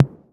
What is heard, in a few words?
808 SOFT; Wizoo - Platinum 24 Electronic